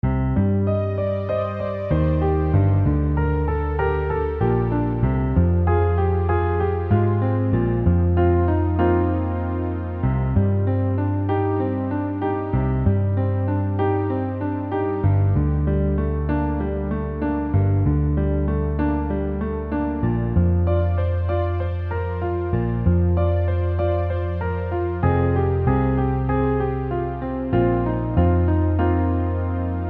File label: Lead Kindly Light med acc